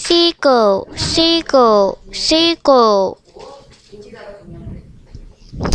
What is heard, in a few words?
seagull